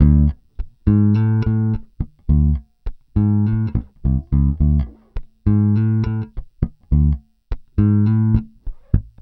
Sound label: Pop, Bass